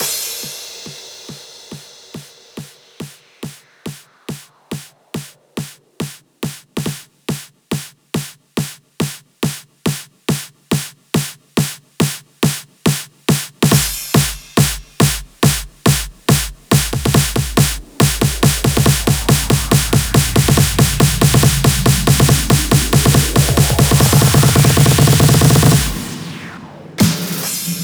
140BPM Riot Filler Full 16Bars, Hands Up Trance Fills; Vengeance Samples